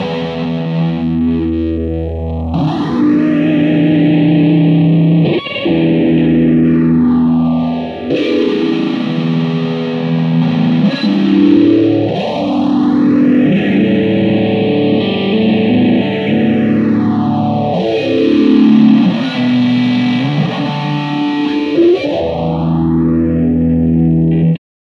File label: Electric Guitar; neuro; Stanza